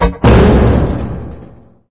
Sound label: dog gunship drop; ambient; source engine; episodic; intro